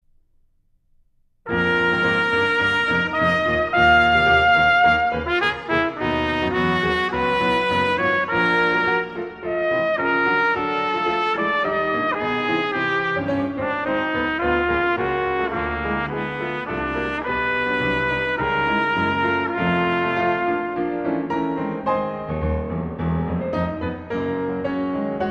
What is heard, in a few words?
Sonata for Trumpet and Piano, Mit Kraft